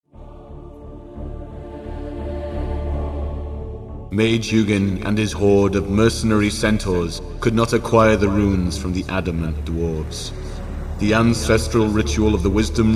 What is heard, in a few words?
Mountain Clan Win; Disciples II Dark Prophecy Gold Edition